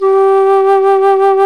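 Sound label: alto flute, vibrato; Roland L-CDX-03 Disk 1; A.Flt vib 2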